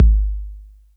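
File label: bass drum 808 Mid Color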